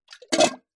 household teapot metal old set down full of tea; zapsplat

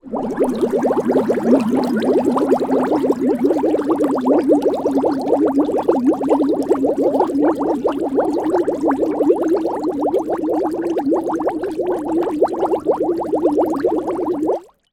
water bubbles small long burst; zapsplat

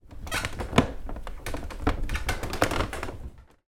household sideboard unit movement wooden

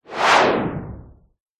whoosh white noise harsh; sound design

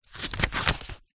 household book thick paperback page turn; zapsplat

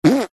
human hands wet squeeze together fart noise

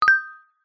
notification bright metal tuned mallet chime hit; zapsplat

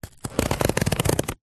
cardboard score cut slice with knife

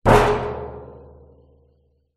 impact metal oil drum empty

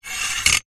household clothes hanger slide on metal rail

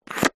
animals lizard tongue eat insect

